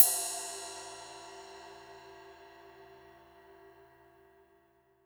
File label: RIDE, Drum Dry; East Collexion; Drum 1 Dry